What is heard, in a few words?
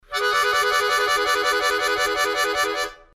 Draw Sideways Flutter, harmonica